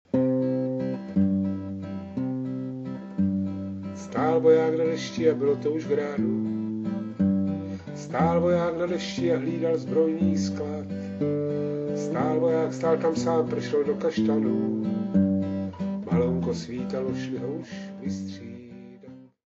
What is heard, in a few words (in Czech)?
valcik